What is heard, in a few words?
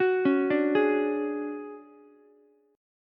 D Major 7th Flat 5th D down Ukulele; arpeggiated; JGuitar